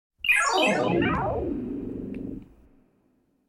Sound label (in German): sich abbauendes energiefeld; Science Fiction